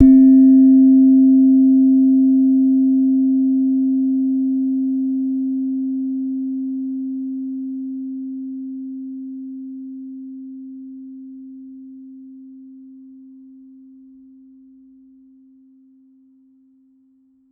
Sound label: Slenthem, Gamelan